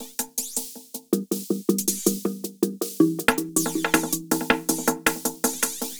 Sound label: DRUM LOOP ambient 160